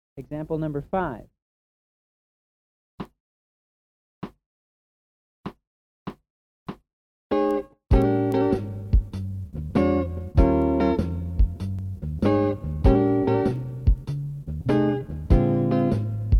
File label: Shuffle Blues In Bb